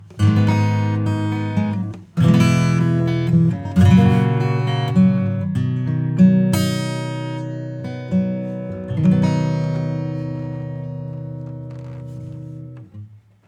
acoustic; Kirchhoff

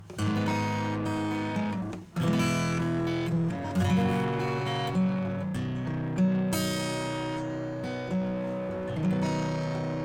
E Gtr

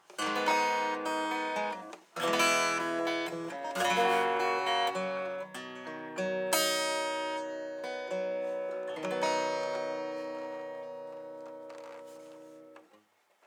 LOW CUT high pass; Gem EQP

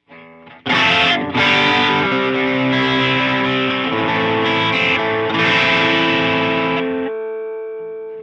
RATE 20.00Hz; TriceraChorus

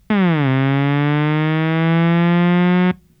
Nord Lead 4 Enve mod down

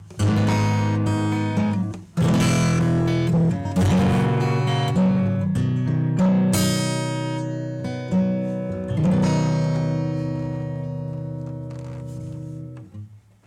E Gtr Crunch; Neural Q

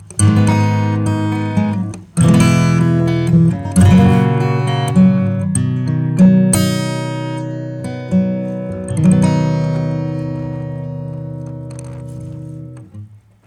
Guitar Bright; Nanopulse

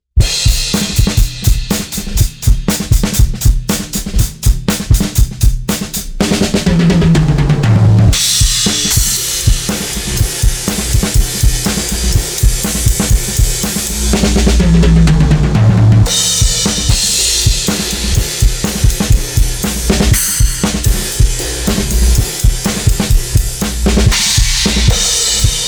drum; COSMOS

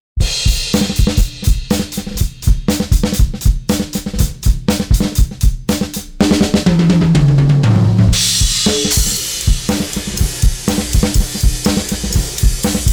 drums; smart reverb

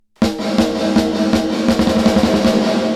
Plate Reverb PRE DELAY

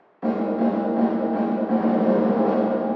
Plate Reverb LOW CUT HIGH CUT

